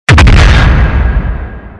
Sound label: strong heavy hit slowed down